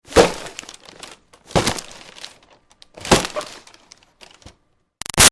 Foley Box Hit Xmas Present x3; Crinkle; Objects

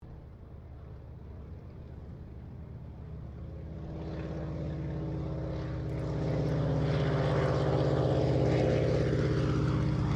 AIRCRAFT 1916 SOPWITH PUP REPLICA PASS STEREO; Replica of a 1916 Sopwith Pup; Airplanes; Vehicles; Aircraft Collection

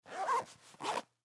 Pool Cue Bag Zip, Pool Cue Bag Zip Up Zipper; SPORTS; SoundEffects-Blastwave

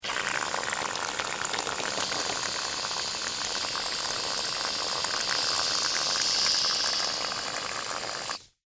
Mousse Can Spray, Household, Mousse, Can, Spray; Spraying (Sound Effects:Objects); BlastWave

